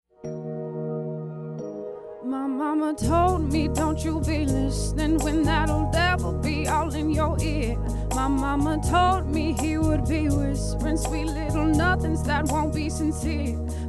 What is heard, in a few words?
mix SM58, handheld mic; shootout